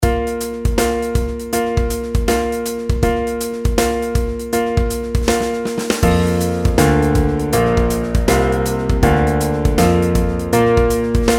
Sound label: pitch patterns skipwise